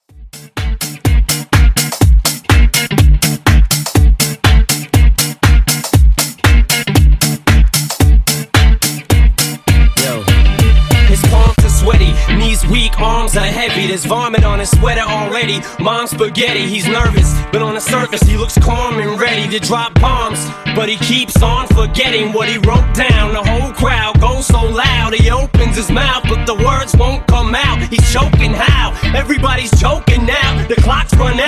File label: G Funk Transition 126Bpm